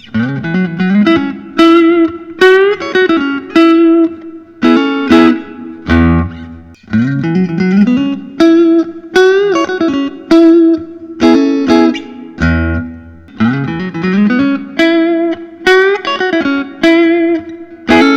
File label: grosh retro s; fiesta red